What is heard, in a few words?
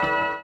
orient, bizarre guitar; Spectrasonics